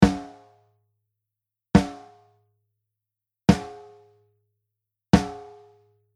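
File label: Snare with R Bass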